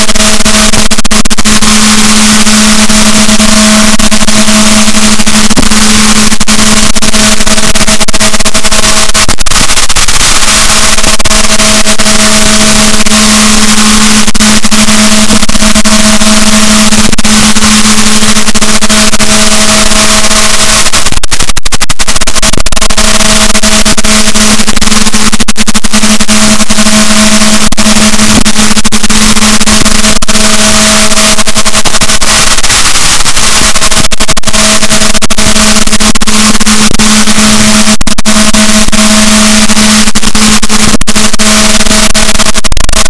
rorquals positif, Balaenoptera Physalus; boat; SNR -6dB

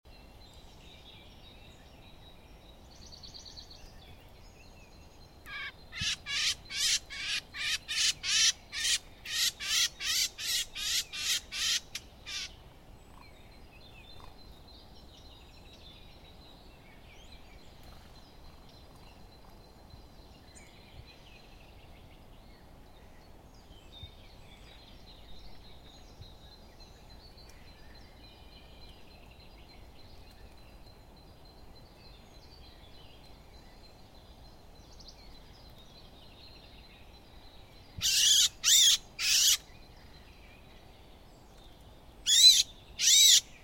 Sylvia atricapilla; SETE

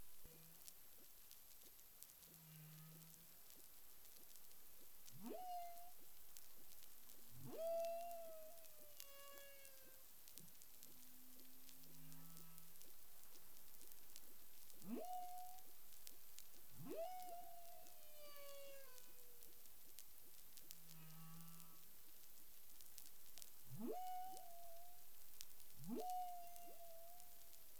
humpback whale; CARIMAM

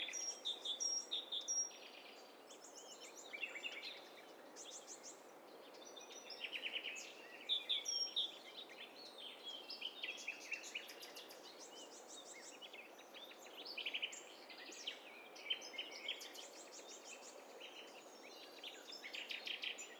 STEREO BIRD COCKTAIL PARTY

